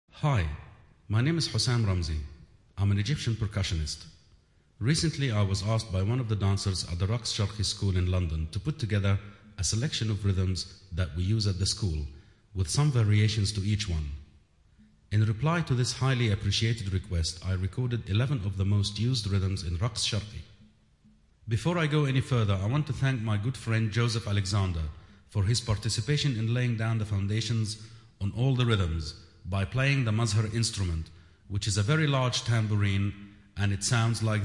Dance Rhythms Introduction, Egyptian Dance Rhythms